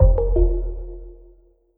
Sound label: Alert; Miasmata